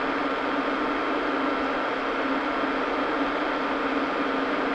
crt noise

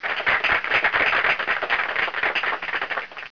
clap

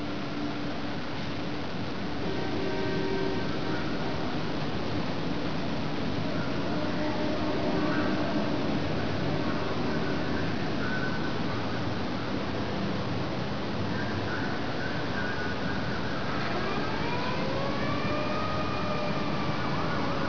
city

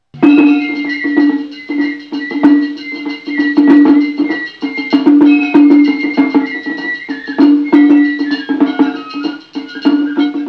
music; dojo

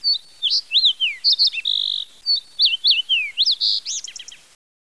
bird; de season css

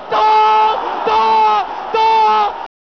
toooor; fun streetsoccer

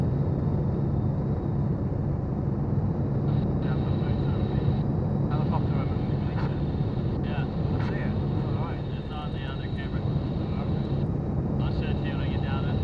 radio; skywatch

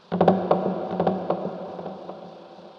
scary distant; svencoop; zombie nights

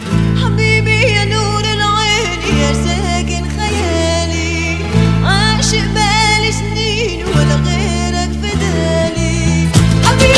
arab pop

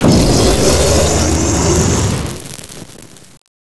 flame burst

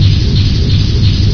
steam leak

